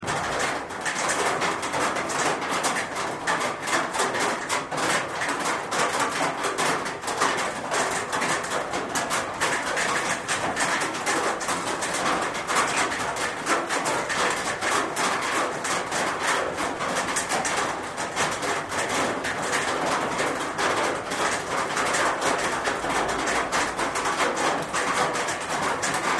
rain on a tin roof

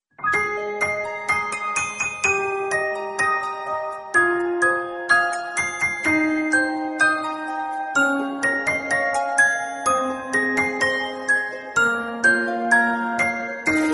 cute ringtone message

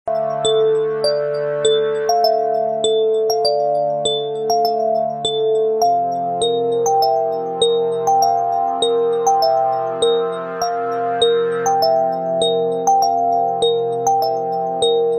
vertu dj, vertu remix

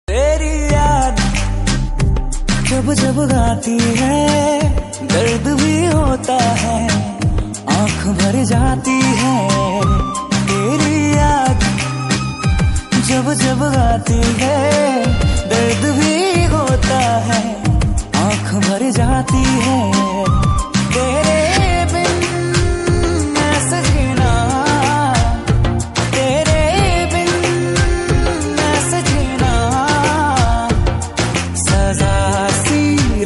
Hindi Song; India

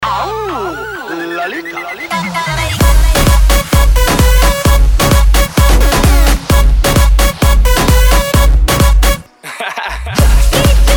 ringtone, remix

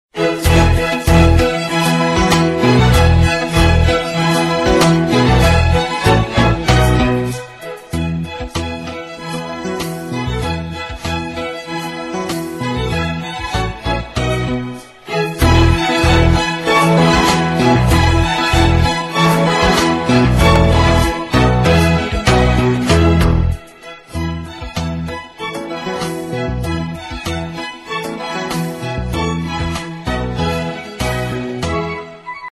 spring violin

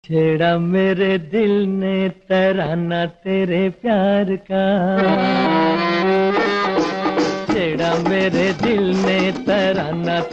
ringtone

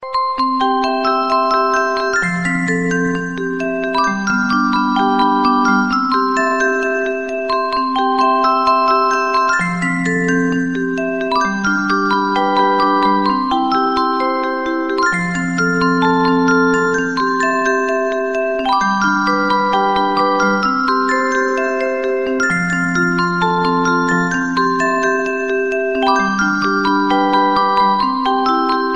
wind chimes musical instrument

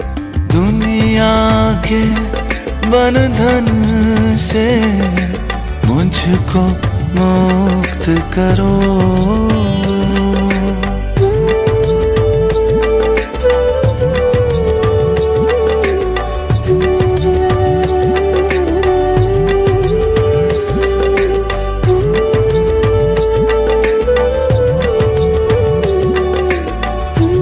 Male

